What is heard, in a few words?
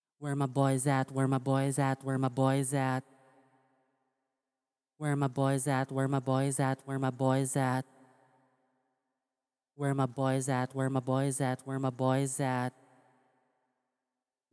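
HH 106 C sharp m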